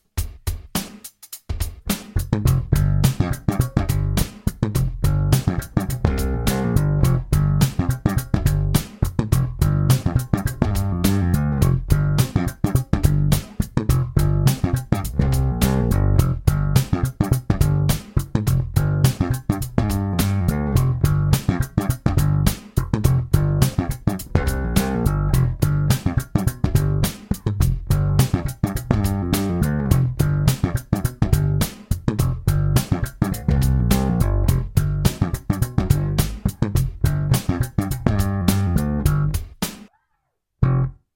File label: Swing slap in G